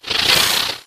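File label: Drone Movement Turn; Titanfall 2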